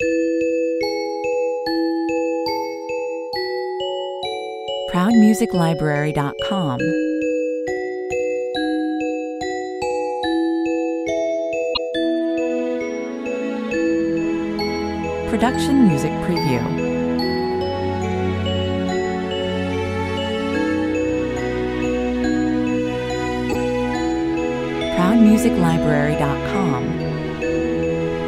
hush little baby (music box full version)

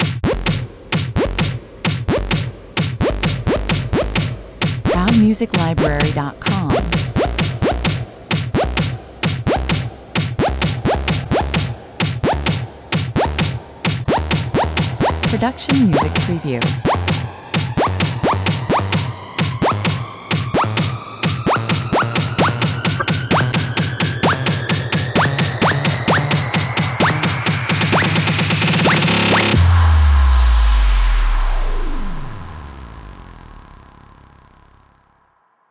long riser

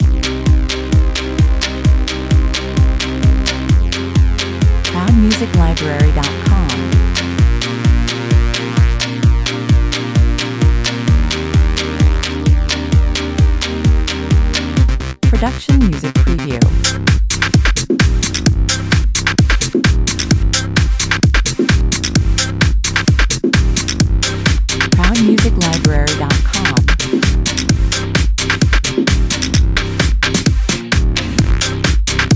drum 130 bpm

